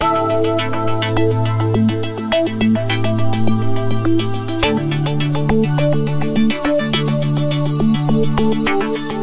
104 heavenly choosing path, SYNTH AND ELECTRONIC LOOPS